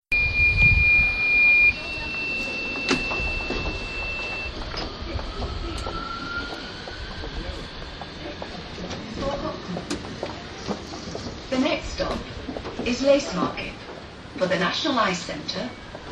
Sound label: outside the tram at station street